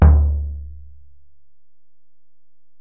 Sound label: Ac BD, Alesis DM-PRO; 300 Drum Machines